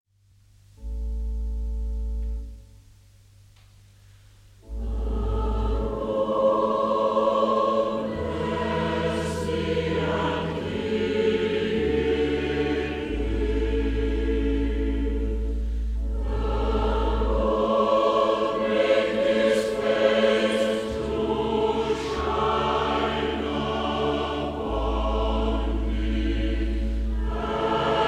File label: Choir, hymns